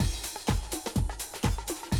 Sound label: 125, Classic Drum Loops; Best Service; XXL 1500 Classic DrumLoops; Sample CDs